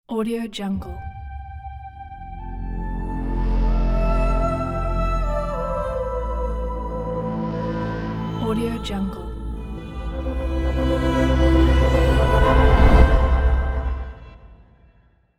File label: epic vocal logo; calmsound